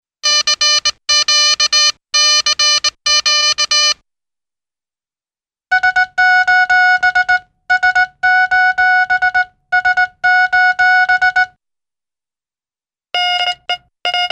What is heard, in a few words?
Morse Code Beeps, cartoon; Hollywood Edge; Cartoon Trax